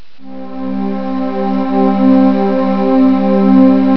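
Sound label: pad perfect 4th; jazz jackrabbit 2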